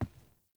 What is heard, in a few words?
Boot Walk Wood, Footsteps; Characters; Enemy; Melee Grunt; Boots; Sinecure